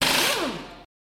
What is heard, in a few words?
Drill, Pneumatic Air; drills